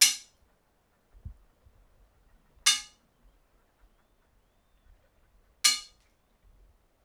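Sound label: 3 hits iron bars centre courtyard recorded top of broch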